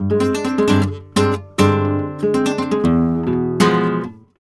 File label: guitar 112 E, Flamenco Guitar Arpeggio; Best Service ProSamples vol.46 - Flamenco